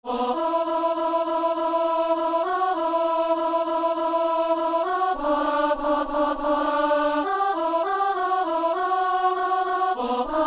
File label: alto; Messe du 17 janvier à Saint-Denis; Je crois en Dieu